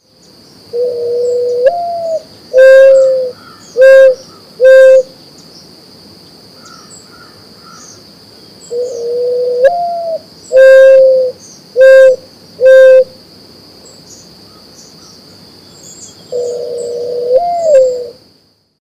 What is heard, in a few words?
mourning dove